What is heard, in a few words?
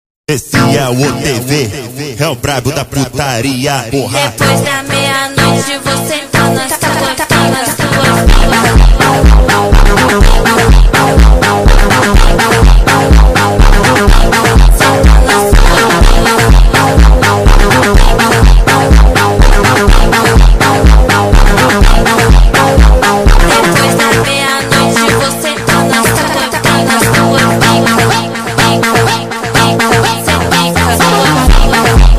Phonk